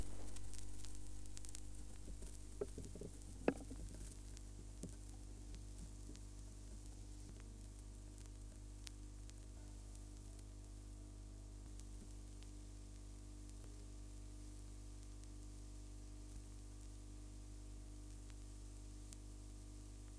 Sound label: Surface Noise Before; GPO